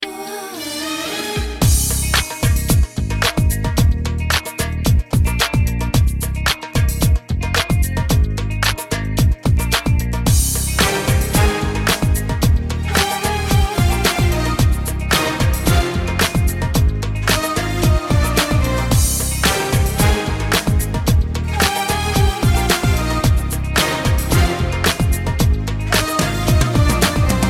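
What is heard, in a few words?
Oriental Ringtone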